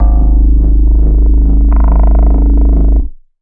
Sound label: synth bass dark formant; multisampled; synth keys